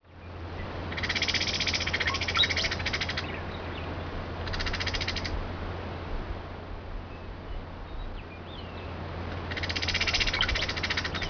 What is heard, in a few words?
brown headed cowbird, eastern washington